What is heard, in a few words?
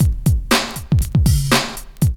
LOOP; X-Static Goldmine 2; IMIX LOOP